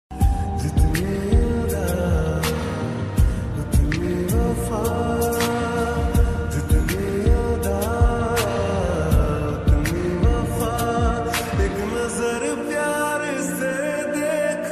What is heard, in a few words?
lofi ringtone lofi remix ringtone